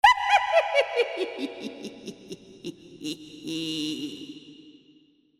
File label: PEOPLE